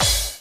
Cymbal short

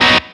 B staccato; osu

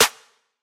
clap snare

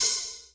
hihat rock long

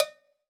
bell

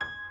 piano high

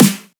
snare electro

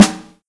drum hit clap

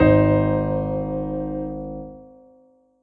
soft hitwhistle

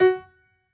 piano hi

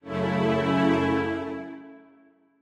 Strings end

